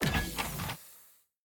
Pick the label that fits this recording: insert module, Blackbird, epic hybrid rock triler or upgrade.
insert module